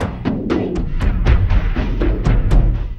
Elektro Crash, Drums; Sonic Foundry (Sony Creative Software); Friendly Fire

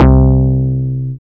BAS, Eurotech; Sounds Good

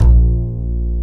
acoustic bass

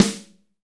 SD Real, Roland; Sound & Vision - Gigapack I

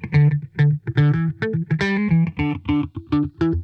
FAT FAZE, Funk Guitar; Zero G - Funk Guitar

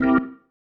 Stab One Shots, Organ

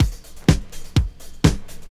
house loop

